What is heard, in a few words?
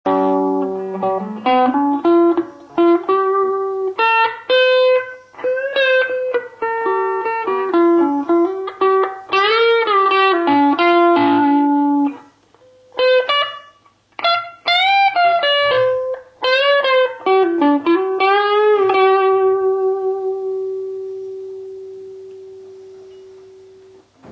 tube emulator low gain